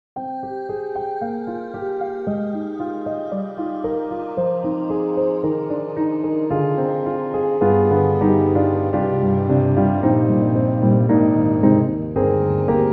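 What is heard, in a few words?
Metallic Flavour, piano; Steinberg HALion 7; Verve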